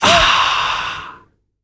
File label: AAHHH, World Voices, Afro noise; ILIO; Vocal Planet VOL-5